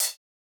Open hat, techno drum

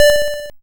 YM Synth D; SYNTHS; CHIPSHOP DELUXE; CHIPSHOP HITS

